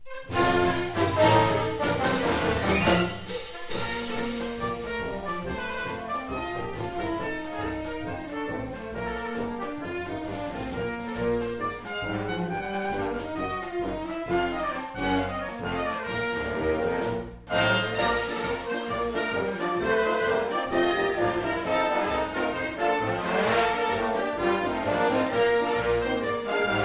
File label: Circus parade; Cirque